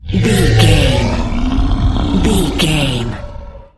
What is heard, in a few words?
large monster roars